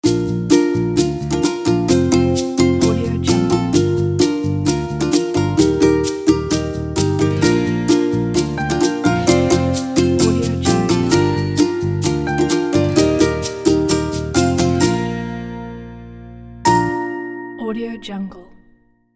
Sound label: ukulele logo